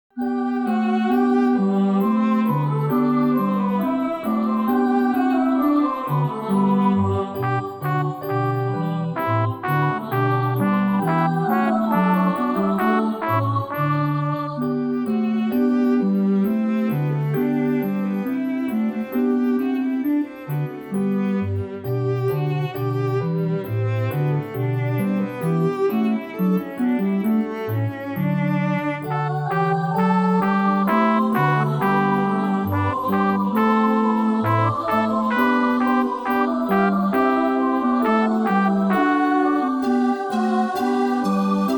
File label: gavotte, yamaha